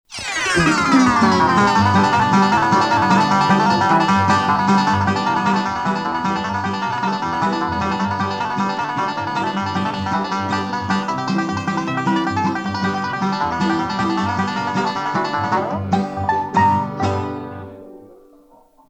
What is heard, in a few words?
Instrumental Snippet